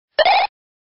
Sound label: tail whip